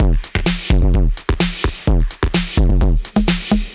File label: Machine Drums, 128bpm, analogue circuit; musicradar